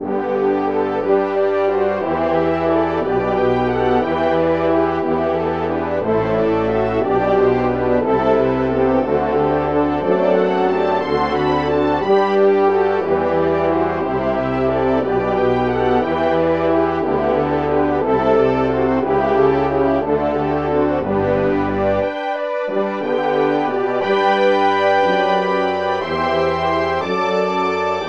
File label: french horns